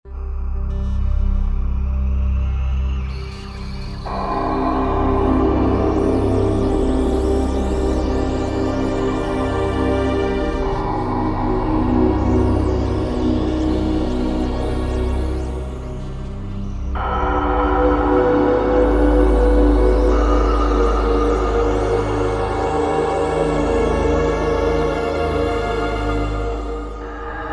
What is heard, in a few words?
Deep Atmosphere, Korg Wavestation A-D